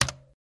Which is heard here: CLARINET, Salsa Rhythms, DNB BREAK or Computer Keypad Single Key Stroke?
Computer Keypad Single Key Stroke